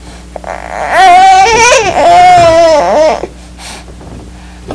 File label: whine